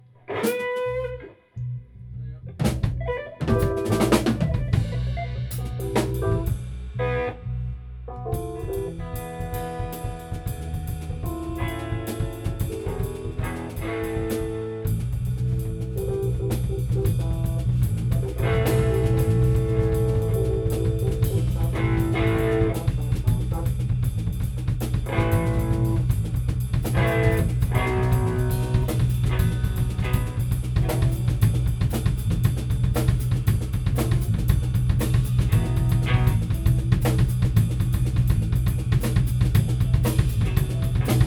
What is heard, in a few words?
Drums & Bass